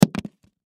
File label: Spackling Tube Set Down Wood; Hardware; Industry; Blastwave